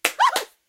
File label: clap; irish pot luck